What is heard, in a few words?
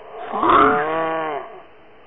zombie alert